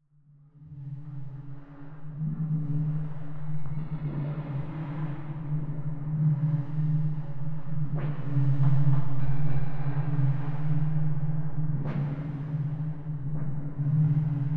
Magnetic Field; 99Sounds; Magnetic Fields

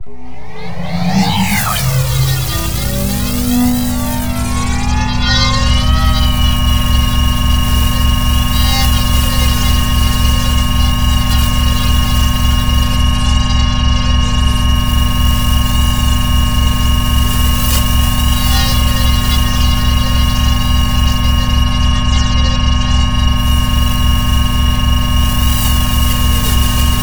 Energy - defense barrier activation idle & stop

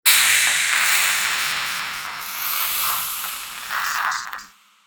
Magic Effect; Alexander Ahura; Groats Part1

